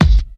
rich mid range kick single hit G key, kick drum sound tuned to the G note